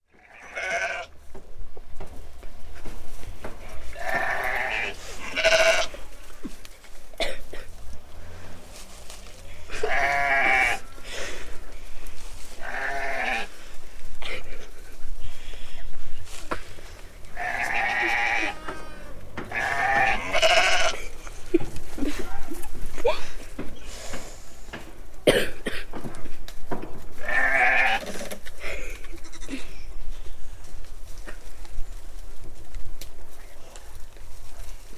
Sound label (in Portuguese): Ovelha; Canelas